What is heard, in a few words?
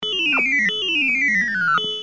BARBELL; arcade